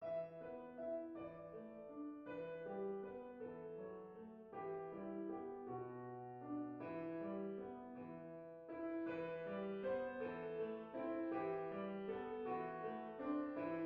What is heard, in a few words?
simplified piano solo; Bethlehems Stable